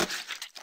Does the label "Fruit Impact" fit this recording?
Yes